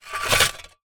industrial shovel metal dig dirt loose stoney ground; zapsplat